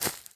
Sand; Blockman Go; Android

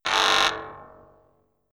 Border Alarm; in-game sound effects; Papers Please; android ios windows gamerip 2013

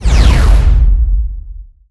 Meteor Falling

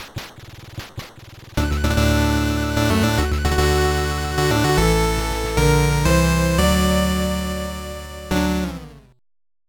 Music - Jingle; fushigi no umi no nadia the secret of blue water; pc engine cd; turbografx 16

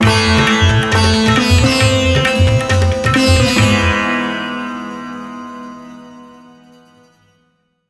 Match Lose; Great Tiger

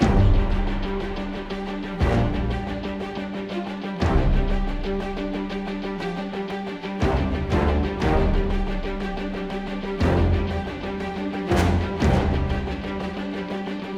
Mission Generic Starting 120BPM Loop

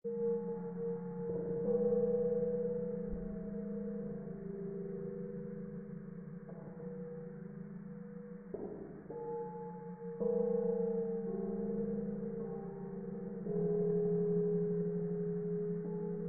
amb melancholic

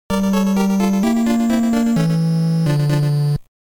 Death; startropics

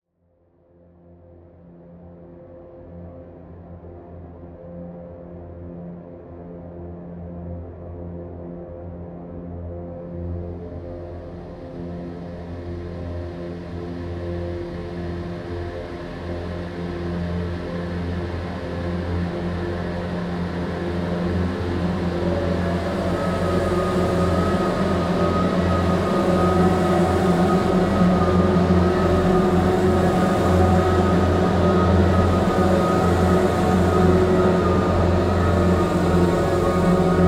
Alley tension music 140

